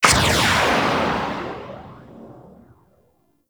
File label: Arch Rocket Crossbow 2D Tail; Warframe; Archwing